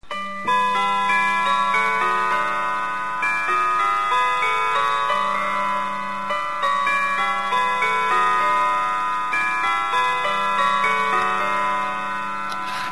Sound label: whittington on bells, grandfather clock; hentschel timeless traditions orleans